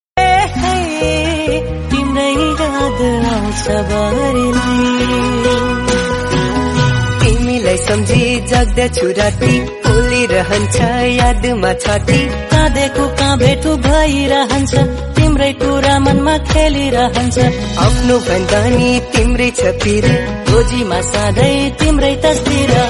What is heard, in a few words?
Nepali Love Song Ringtone - Romantic Song